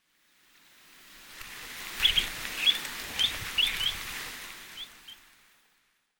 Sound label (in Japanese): uzurashigi; Sakata